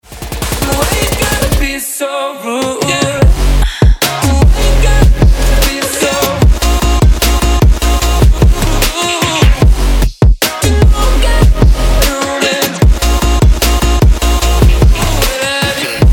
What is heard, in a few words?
BEAT